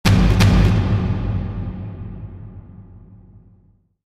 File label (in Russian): zvuki udarov